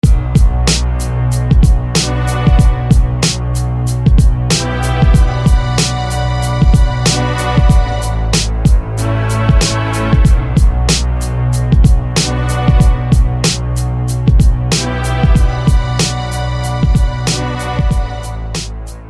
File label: Strings; Arcane; SFB FX